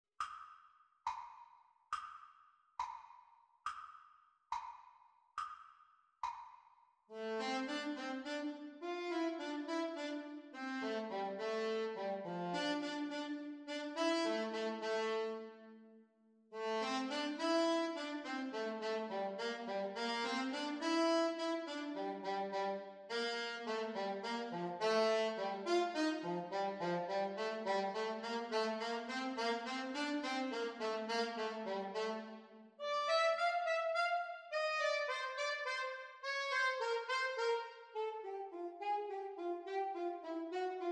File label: Sax Duets Playalong; Practice Speed